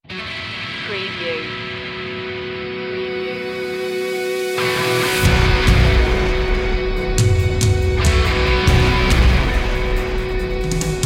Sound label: thunderbolt